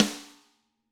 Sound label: Snare Crack